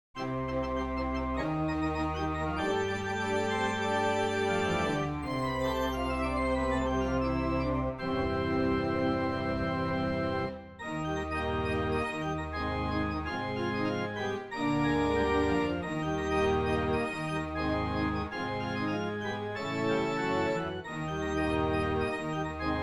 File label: Summer Fanfare Organ